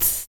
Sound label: HIT, Jazz FX FEM PERC; Spectrasonics; Vocal Planet